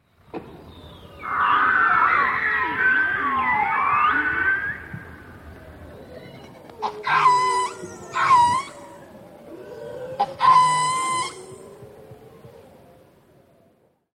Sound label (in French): Beluga; baleines et dauphins